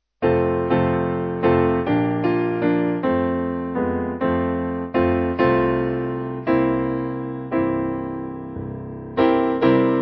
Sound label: Piano